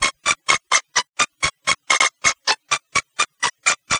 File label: Fast Forward, Machinery; Sonic Foundry (Sony Creative Software); Myths of Technology